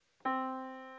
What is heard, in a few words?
yamaha Disk